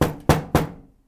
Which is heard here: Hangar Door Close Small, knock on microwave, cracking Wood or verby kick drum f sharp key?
knock on microwave